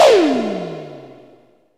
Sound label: Cheezy Drums Single Shot